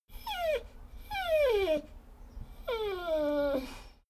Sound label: dogo argentino whine whimper; natur-tiere; hunde